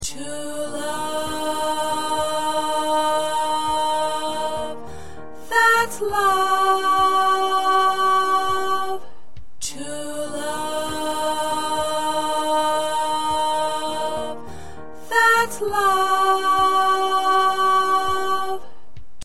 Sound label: 2nd Soprano, choir